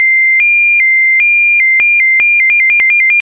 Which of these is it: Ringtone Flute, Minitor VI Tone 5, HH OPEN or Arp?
Minitor VI Tone 5